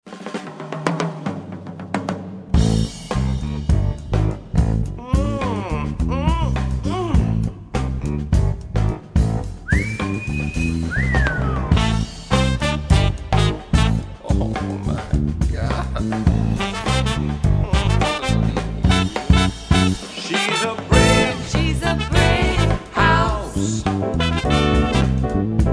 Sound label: vocals